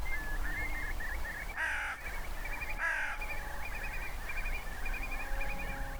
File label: curlew crow